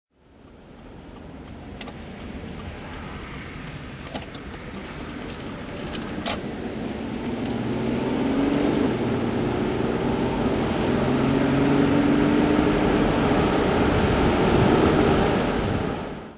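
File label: jaguar xjc v12 drive, engine sound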